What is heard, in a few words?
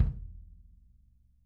L KICK 2 P 3 R, Interactive Drum Kits; Sonic Reality